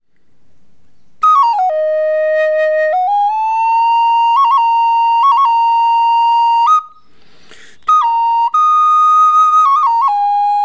Anillo seco

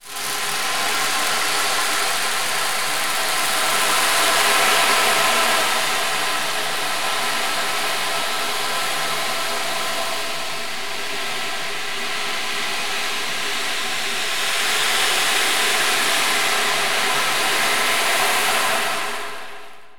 oceanico reverb; oceanico 42 cenefa oscura rombo azul

